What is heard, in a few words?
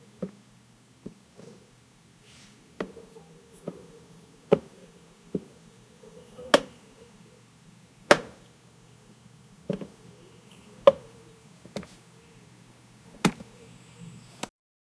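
success of completing, human emotions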